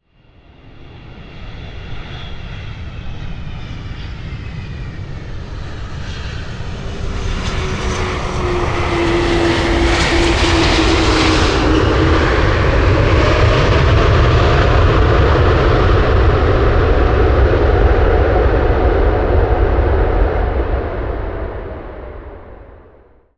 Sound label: passenger plane landing on runway